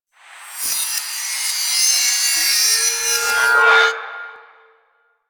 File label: Backstab Blades Hover Circle; Warframe; Warframes; Pax Duviricus